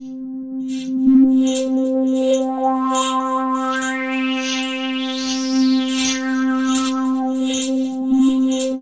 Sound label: amb c, Bell Atmos, Chillout; Best Service ProSamples vol.36